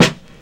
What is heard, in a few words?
00s fat acoustic snare sound d sharp key